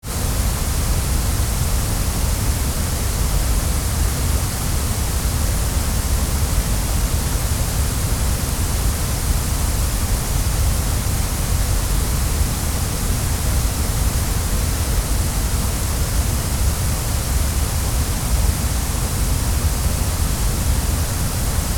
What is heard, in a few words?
Waterfall Big Niagara Artificial Side And Above